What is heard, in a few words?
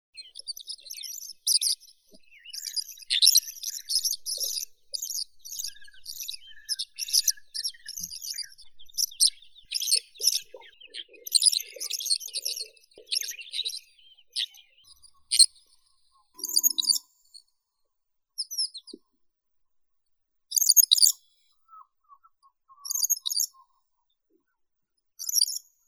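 Mongolian lark melanocorypha mongolica; zvuki prirodi; zhavoronok mongolskii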